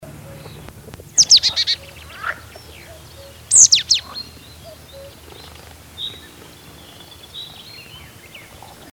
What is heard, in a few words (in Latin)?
Poecile palustris; SETE